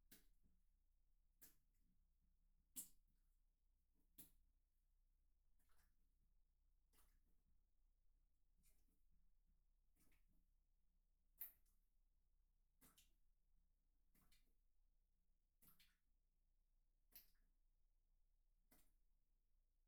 ピストン 低速; KU100; アダルトな音

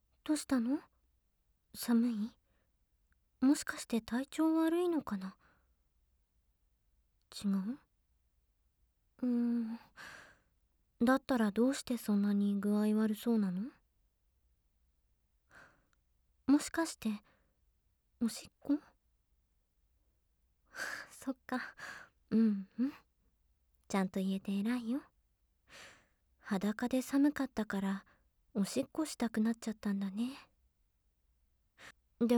裸で紙コップにおしっこ, ASMR

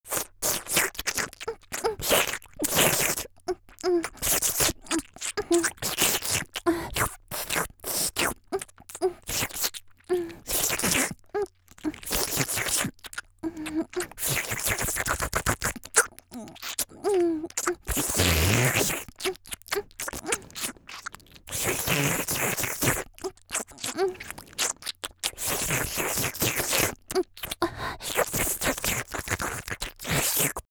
「フェラ」激しい30秒; 綾波系少女

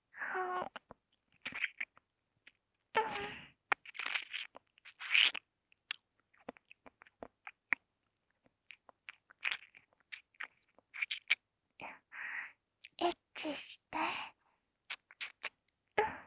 エッチしよ 耳舐め, 左, バイノーラル; ハーレムオナサポ 4人のメイドがご主人様のオナニーをお手伝いするバイノーラル～文、千曜、名月、莉桜～

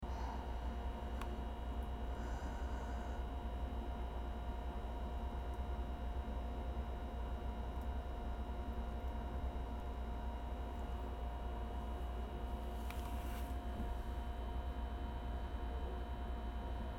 大、吐息大きめなミチ音ポチャ音

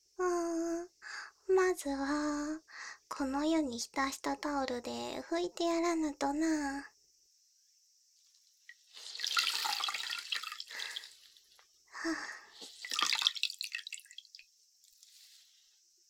右耳 耳かき 環境音有り, ASMR

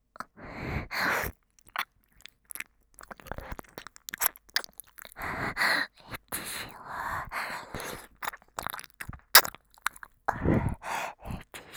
エッチしよ 耳舐め喘ぎ; DL製作班; ハーレムオナサポ 4人のメイドがご主人様のオナニーをお手伝いするバイノーラル

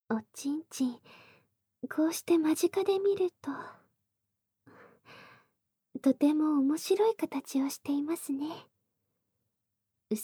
尺八; 効果音なし

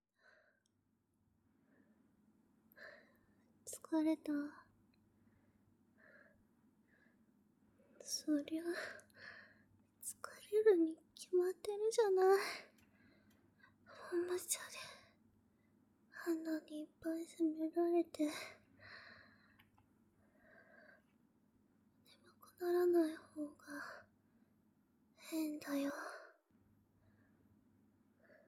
寝息; 罰ゲーム×寸止め×懇願彼女; Sな彼にイジメられシリーズ

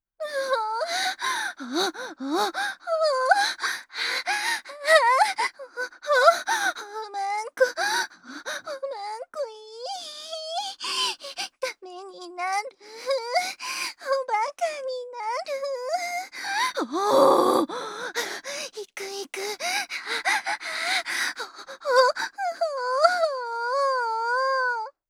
んおぉっ♥おっ♥おっ♥ほぉっ♥

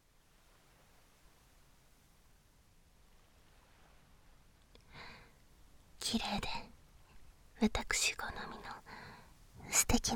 耳舐め, ASMR

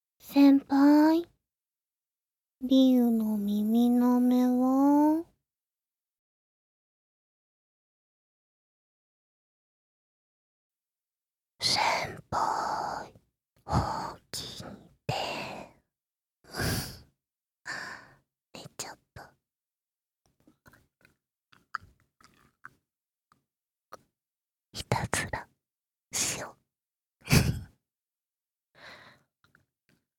ちろちろ 悪戯 耳舐め

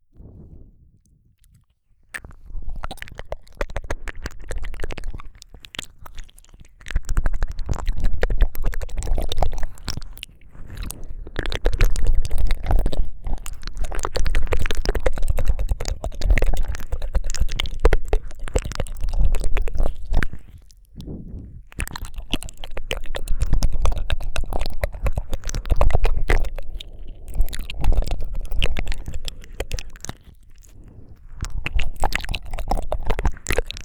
耳舐め (両耳)

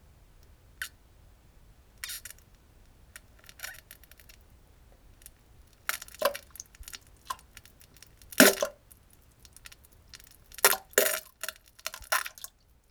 なんべん：ぷっ ぷふ ぷりゅ（ぼたっ た）ぶふっ （ぼたた）ぶぷっぷ（ぽ ぼたっ）ぶりゅ

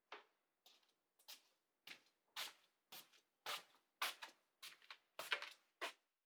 廊下を歩く スリッパ

